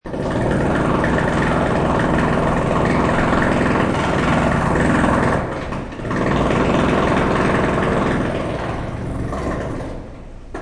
Muffled but strong sound of a jackhammer pounding on the other sid; Jackhammer Behind Wall; Muffled but strong sound of a jackhammer pounding on the other side of a stone wall; Power Tool Sound Effects